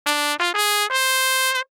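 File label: phrase end vibrato, trumpet; samplemodeling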